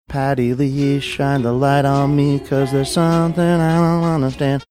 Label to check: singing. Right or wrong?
Right